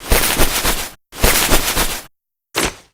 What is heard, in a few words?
rummage pan